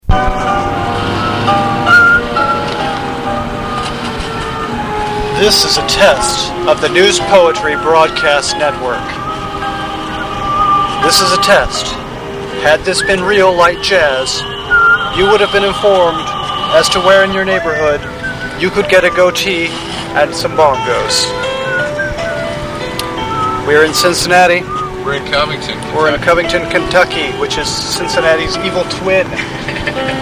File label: Real Light Jazz